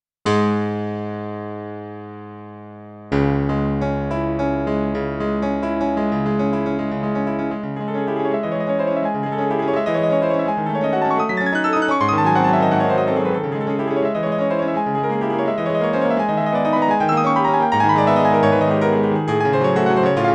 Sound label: P-330 CHO OFF, piano